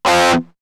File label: Guitar; ZERO G - Datafile DISC 1